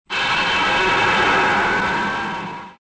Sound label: metal creak; Soldier Of Fortune II Double Helix; XBOX